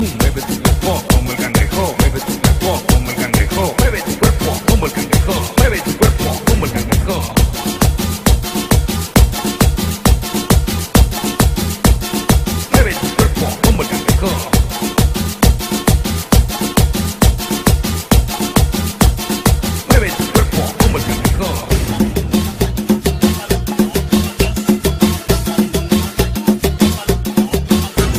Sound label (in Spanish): ritmo del cangrejo; Latin